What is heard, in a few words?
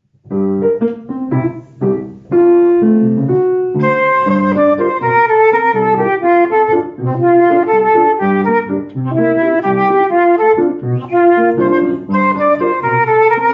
with Solo